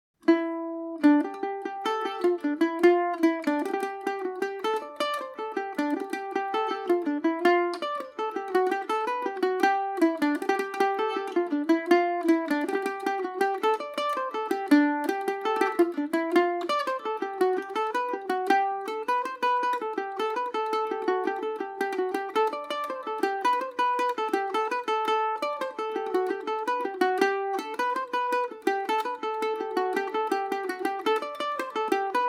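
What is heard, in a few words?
G major paris swing, irish mandolin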